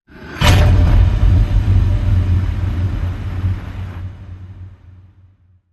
Vanishing Footsteps Spirit Hit

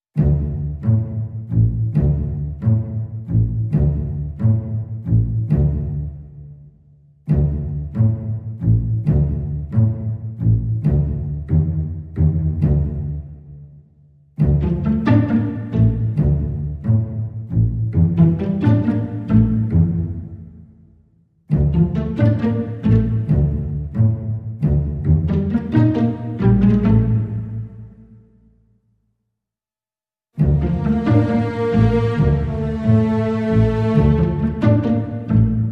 Strings Only

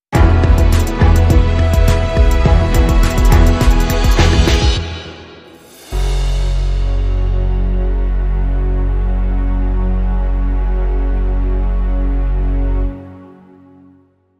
Long Sting

